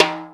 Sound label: Timb, Yamaha RY-30, Percussion; Drum Samples